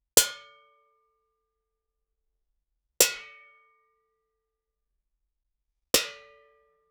baseball bat hitting a

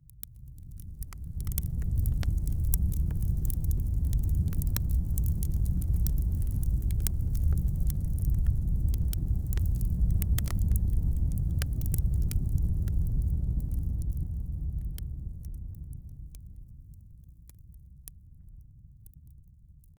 blend the natural crackli, chanting

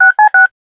approval sound, mobile

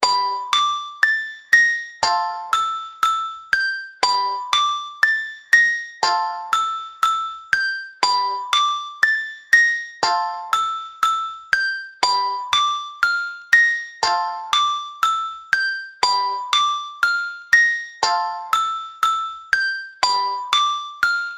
music for kids game, adventure

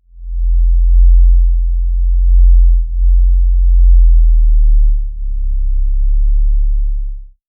deep bass thump rising cr

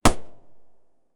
arrow

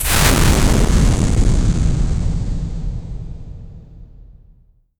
massive metal impact explo; camera shake

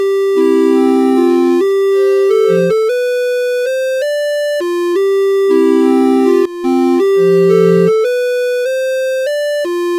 a sample for a pixel, pixel game

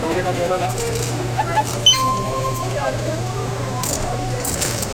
energetic chatter of cust, shopping

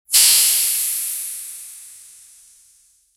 a toxic potion splashes a, magic

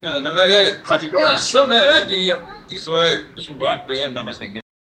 audience chuckles

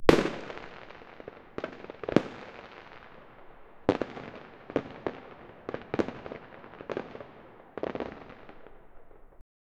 fire works blasting off a, fireworks

